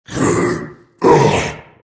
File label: grunt; dota; abaddon